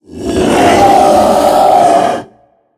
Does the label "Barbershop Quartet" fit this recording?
No